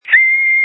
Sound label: Scream